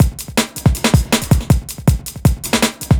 speedloop 160bpm; dr.dezim

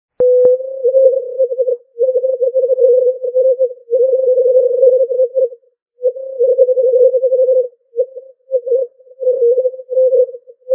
elektropigeon, beeps

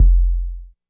kick

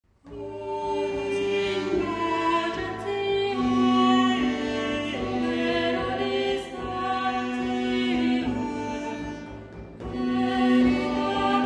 chorus

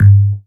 alien

